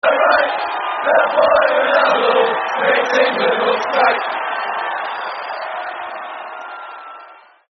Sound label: That Boy Ronaldo (With Crowd); Man United